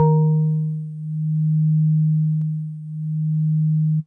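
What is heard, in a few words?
House Organ DX100 D#3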